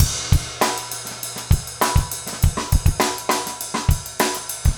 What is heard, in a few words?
basic funk 100o